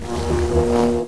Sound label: buzz, hornet; XDM